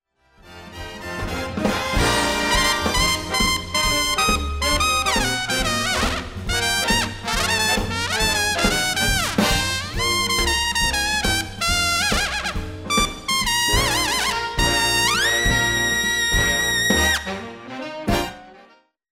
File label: Trumpet Solo